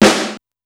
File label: SDS2000 SN; SDS2000 Factory5